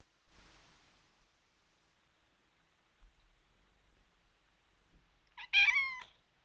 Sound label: mew